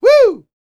YELL, MALE HUMAN; Spectrasonics Vocal Planet

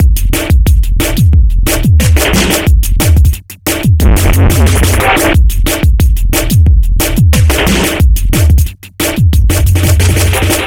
dubbydrum distort 090bpm; Zero-G; Downbeat Dance Grooves

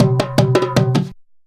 junku groove; Best Service; World Colours

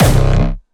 Distortion Kicks Kick C1; Bassdrums; Vengeance; Essential Clubsounds Vol.4; Bonus TonalHS Kick

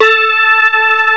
ORGAN; CMI Sound Library Rev 1.3

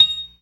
PERC, Synth and Organ; InVision Interactive; Keith Emerson; The Most Dangerous Synth and Organ; CLASSIC C3 4

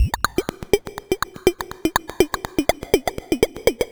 Percussion Loop, Synth Percussion; Sonic Foundry (Sony Creative Software); Dr. Fink's Funk Factory

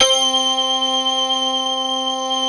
ATTAK MOG C4, Analog Fantasies, MOOG; USB Soundscan vol.49 - Analog Fantasies; AKAI

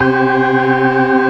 ORGAN ALL STOPS; Keith Emerson Signature Series

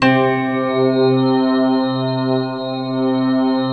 VFX BELL, Synthesizers

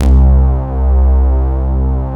VAMPING C2, Synth; Big Fish Audio; Synth City; DRACULA

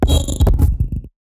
F-D Distorted Bass Tone; neuro; BIG5AUDIO

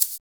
DNC SHAKR; Drums In Your FACE!

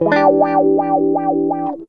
GUITAR LICK; Ueberschall; Jam Box

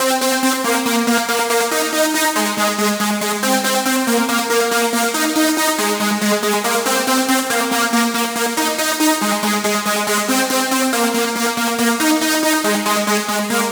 140BPM C; Vengeance - Essential Clubsounds Vol.4

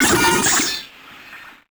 Malfo Syn, malfunctioning synth

